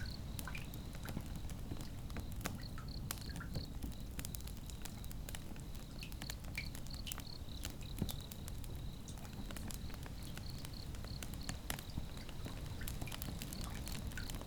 outdoor night bath campfire

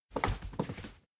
dog pace; anemoi

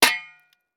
shove hit rock; anemoi

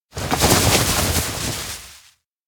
bouquet reach multi; anemoi

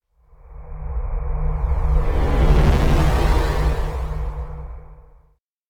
stagnation morph; anemoi

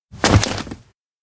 shallow lose balance hand on rock; anemoi

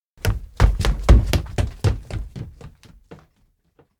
steps outdoor classroom run; anemoi

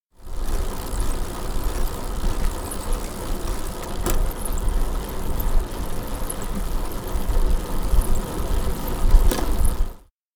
bike pedal; koyomi; anemoi

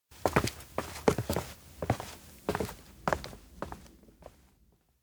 steps indoor nap room go; aino; makoto; anemoi パッケージ版 1.1

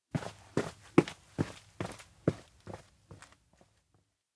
steps indoor brisk walk; meguriai; anemoi パッケージ版 1.1

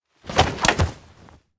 frisbee dunk; anemoi

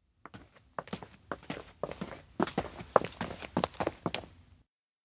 steps outdoor to here; awayuki; anemoi

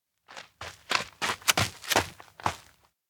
steps outdoor soil runup; ken; anemoi

